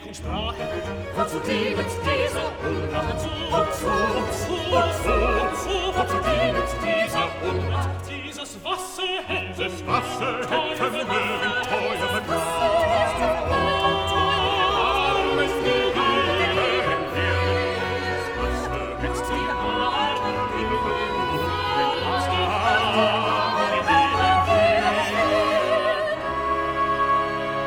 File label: Chorus; Wozu dienet dieser Unrat; Bach Matthew Passion